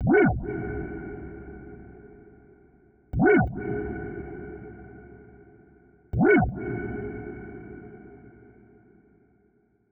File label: reason syn; neuro; Sinistarr